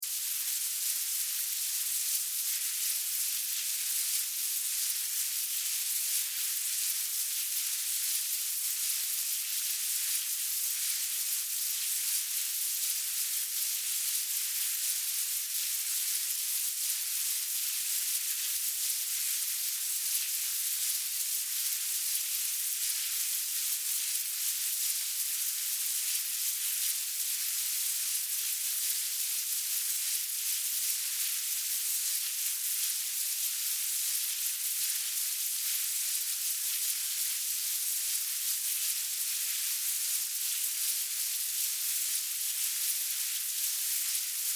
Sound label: Ant Hill Hive, Animal, Ant, Hill, Hive, Movement, Colony, Insects, Bugs; Surround Sound; 5.1; BlastWave